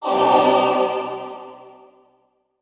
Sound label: Music Effect Choir, choirs; Short Female Choir Chords